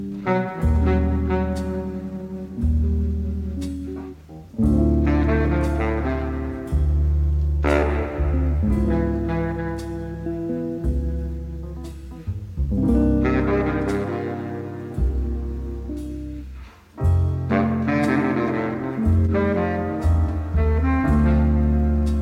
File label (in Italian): night jazz per sax baritono